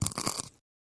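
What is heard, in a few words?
Grinding Mortar Pestle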